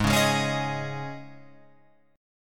G sharp Suspended 4th, Guitar, Open F, G#sus4 chord; G#sus4 strummed; JGuitar